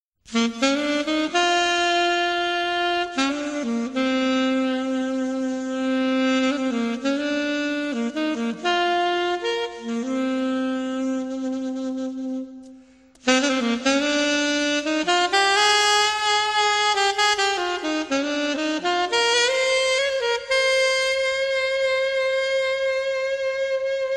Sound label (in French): saxophone